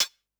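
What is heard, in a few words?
closed hi-hat; eddyflux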